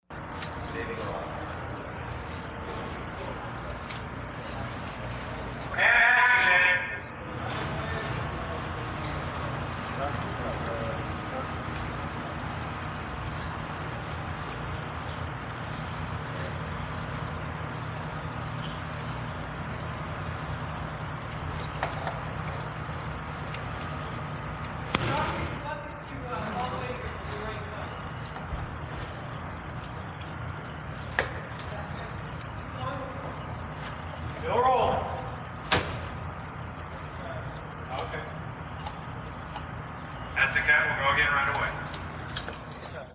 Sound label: Movie Set Amb Action